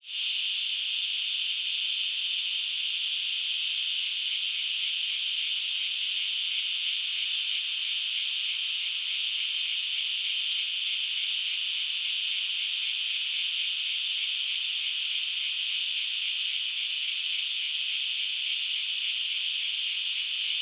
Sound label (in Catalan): Insectes Cigales